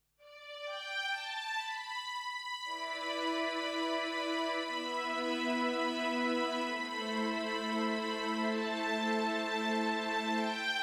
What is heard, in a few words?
Strings